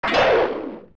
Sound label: Iron Knuckle Attack Swipe